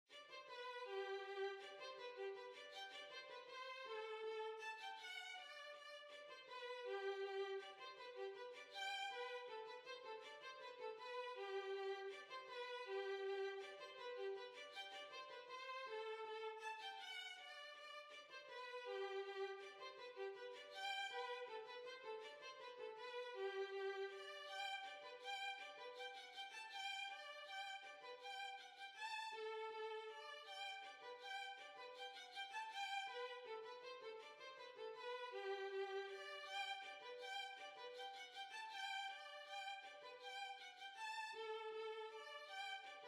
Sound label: Hornpipe, Violin